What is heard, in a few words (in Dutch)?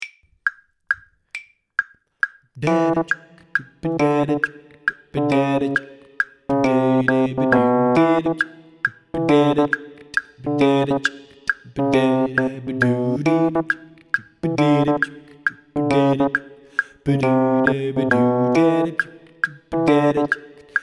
carol bas